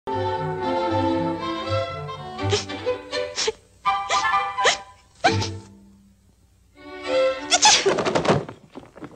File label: sneeze; dumbo